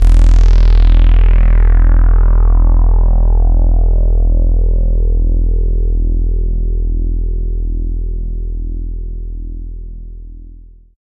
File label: BASS, Tekno Industrial; East West